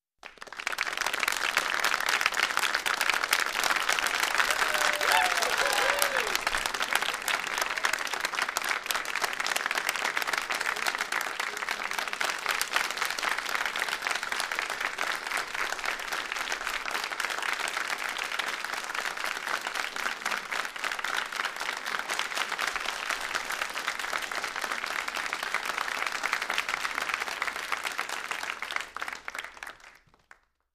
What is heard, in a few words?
Football Crowd Appl, sports arena football